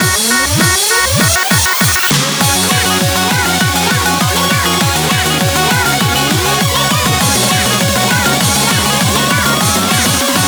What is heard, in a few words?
Bgm Reach